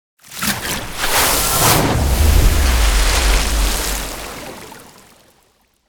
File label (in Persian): افکت صوتی لوگو موشن